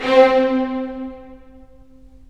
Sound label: STR, Full Short; Roland - LA Composer vol. 4